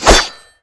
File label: Shing